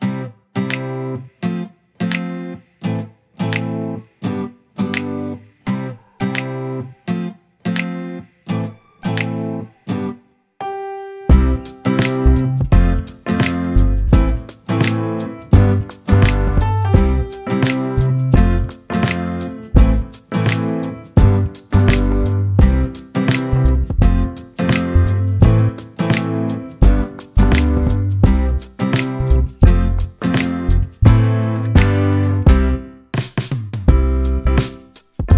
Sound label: Lullaby happy bop with clean ending